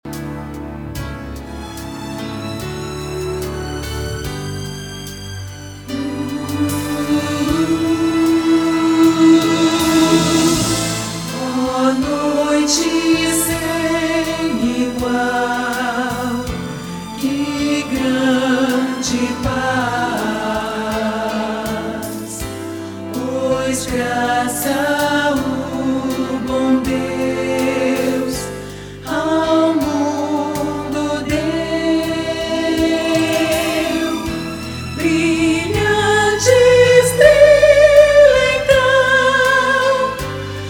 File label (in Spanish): Contraltos; cantata navidena